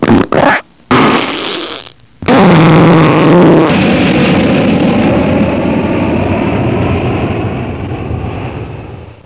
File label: fart